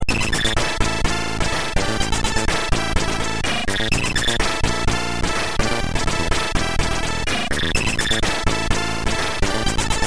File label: c64